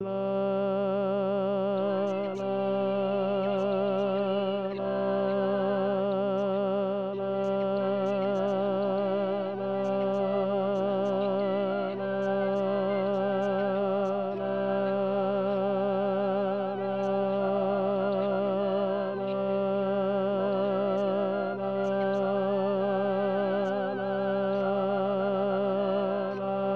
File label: orfeo ouverture tenor